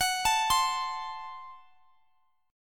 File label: Gb Diminished